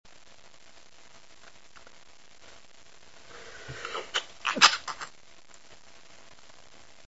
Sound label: stifle, male